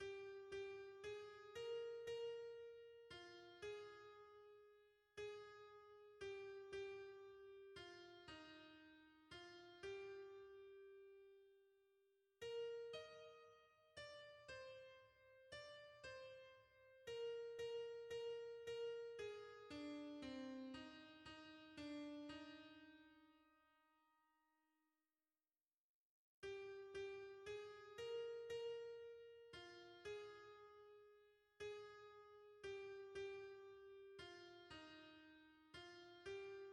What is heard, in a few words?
soprano; O Seigneur quelle dette; hymns